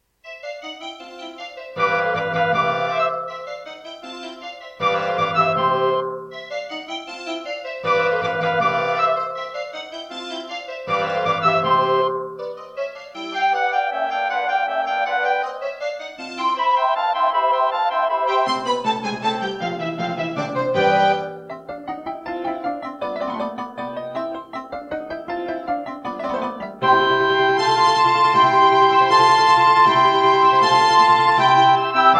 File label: Serdaco Crystal Blaster C1; classical; Mozart; Concerto K467 partial